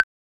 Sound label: trinket hit; minecraft